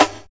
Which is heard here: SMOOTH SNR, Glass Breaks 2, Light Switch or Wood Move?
SMOOTH SNR